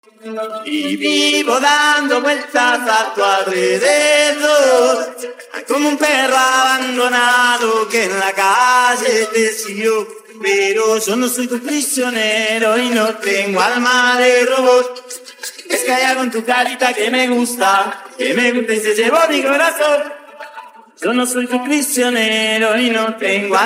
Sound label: Acapella